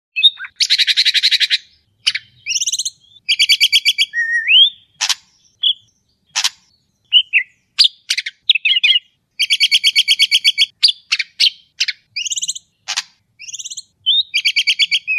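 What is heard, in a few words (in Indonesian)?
suara cucak hijau medan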